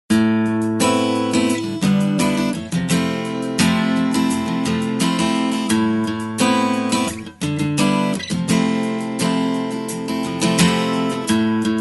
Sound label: classic rock; TELEPHONE MUSIC